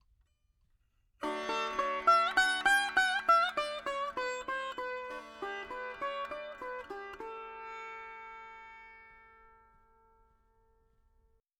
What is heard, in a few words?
4 4 D 100 Dry, Melody Veena; Sample Banks